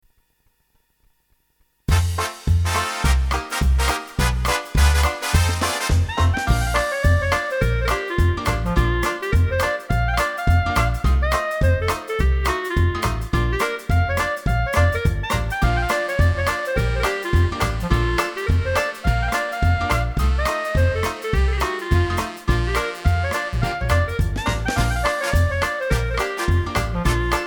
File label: Blues